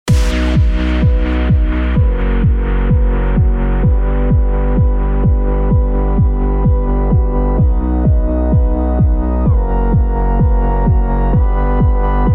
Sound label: ElectroHouse